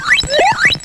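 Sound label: FX right, attacks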